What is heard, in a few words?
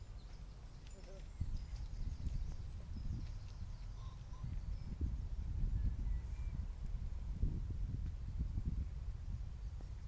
a cuckoo